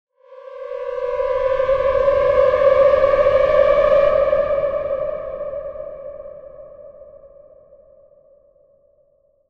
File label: Riser